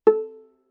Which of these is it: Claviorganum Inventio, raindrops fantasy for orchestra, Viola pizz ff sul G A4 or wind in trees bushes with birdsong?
Viola pizz ff sul G A4